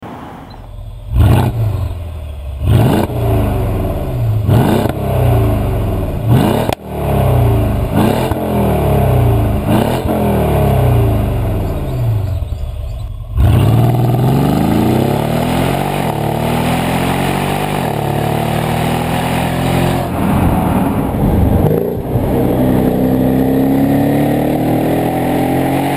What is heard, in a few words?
Mercedes-Benz GL